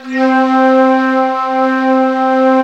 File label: flute C, Chillout; Best Service ProSamples vol.36